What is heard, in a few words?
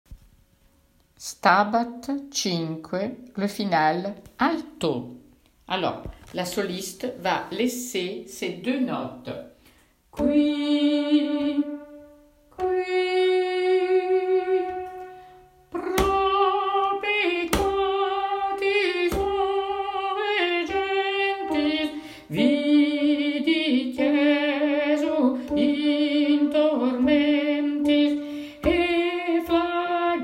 Stabat Alto; Stabat Mater 5